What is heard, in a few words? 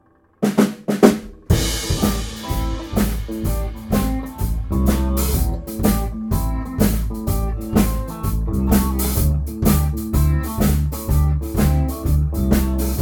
Blues endings